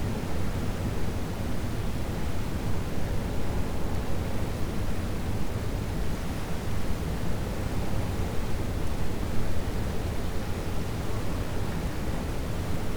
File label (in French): cachalot negatif, Physeter Macrocephalus